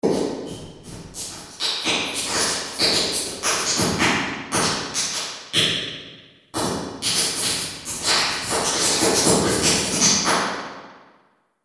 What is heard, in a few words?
reverb room; reverbs; O2R